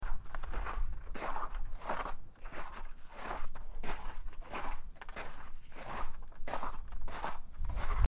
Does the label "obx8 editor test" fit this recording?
No